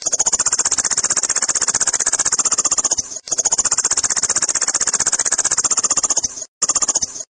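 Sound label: sekretar, secretary bird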